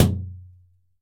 shower, impulse responses; howard fredrickson

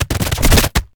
Gun, Bullet, Multiple Body Impacts; gun and weapon sounds; captain audio; fully loaded

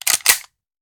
Machine Gun Foley; Sound Ideas; Guns

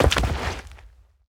Bodyfall Dirt; SFX Kit; Bodyfalls

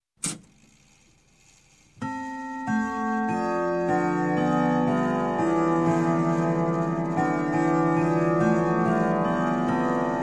Clock, Grandfather Whittington Chime - Strike S; Strike Seven; Sound Ideas 2000 Series

